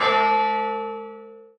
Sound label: church bell